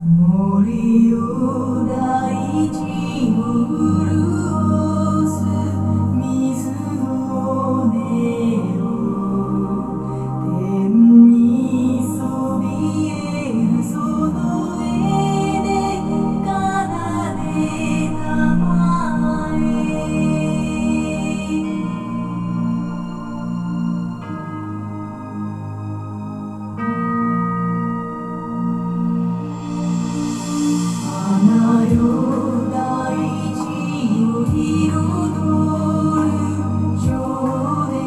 omni music

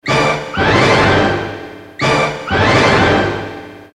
orchestra hit

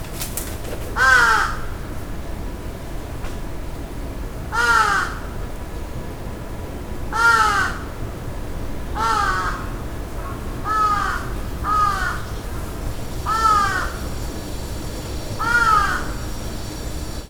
ms bird

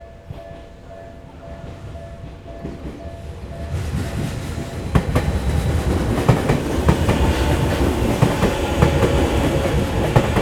ms train

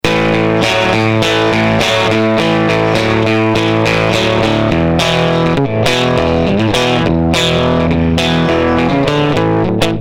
Drive

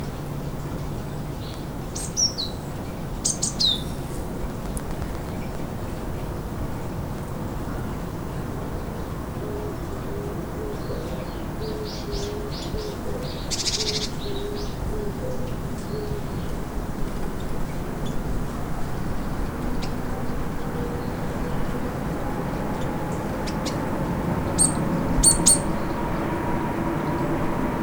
bird

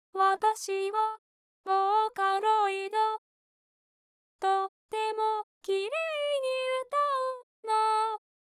mew

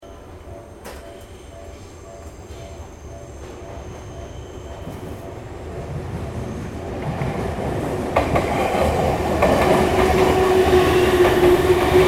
train

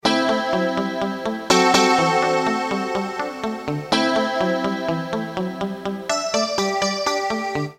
chorus guitar

